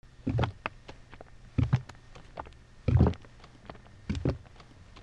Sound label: HOUSE